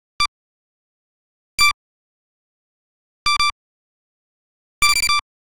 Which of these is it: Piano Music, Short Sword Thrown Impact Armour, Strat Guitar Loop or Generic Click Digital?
Generic Click Digital